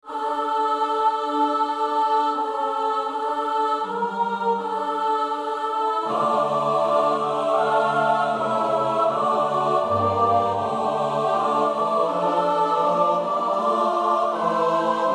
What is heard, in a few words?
Miserere mei Domine (S-A-T-B); Sweelink (Jan Pieter)